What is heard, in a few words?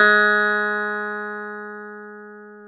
Clav G#4; Keyboards of The 60's and 70's